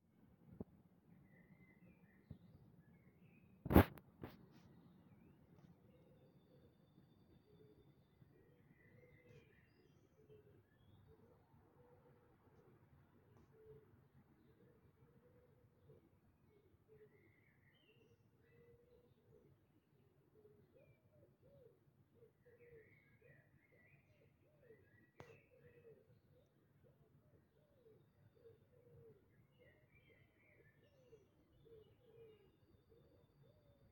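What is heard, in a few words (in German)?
Dawn Chorus, Vogelgesänge vor Sonnenaufgang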